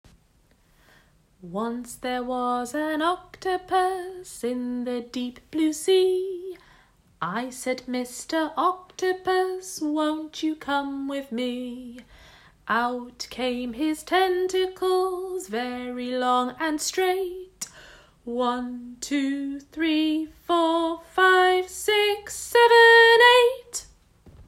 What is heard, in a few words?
Octopus song